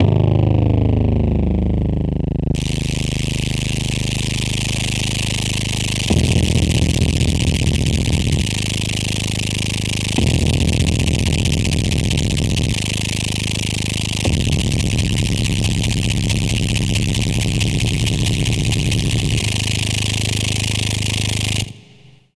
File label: Noise, Dark Organic Processing; Sonic Foundry (Sony Creative Software); Loop Noir